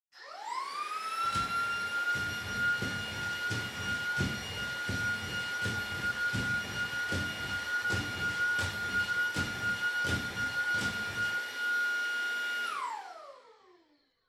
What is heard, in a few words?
Vacuum Cleaner Far Vacuum Floor High